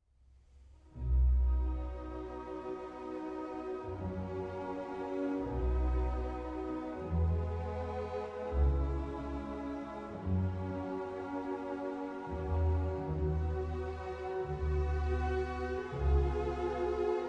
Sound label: Piano Concerto No. 5 in E-Flat Major